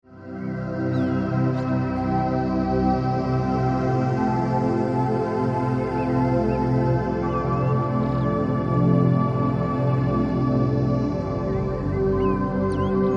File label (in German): Entspannungsmusik